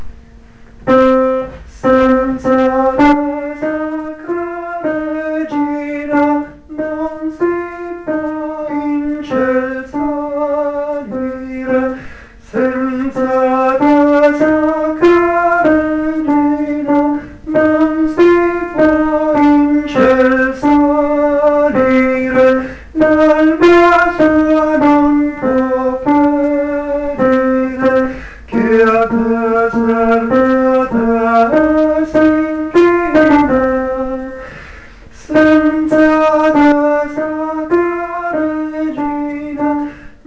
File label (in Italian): tenori